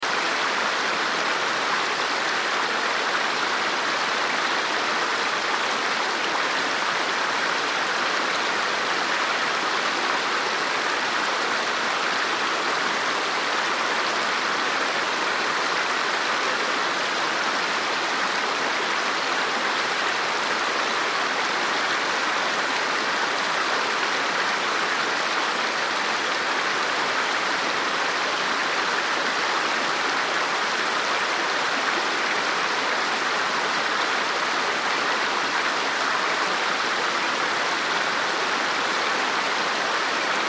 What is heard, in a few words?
Stream Run River, Stream Run River Flow Loop; Stream Run River Flow Large Loop; Nature; Blastwave